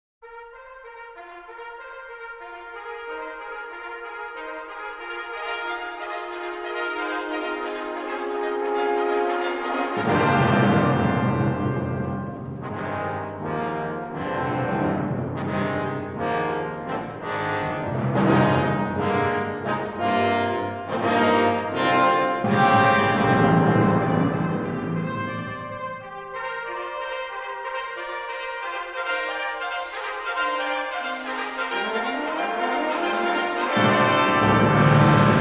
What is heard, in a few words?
Fanfare